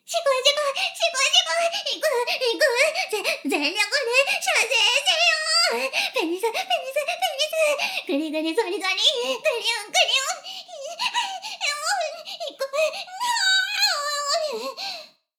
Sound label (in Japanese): しこしこ音声; 牧場戦腺; 少佐殿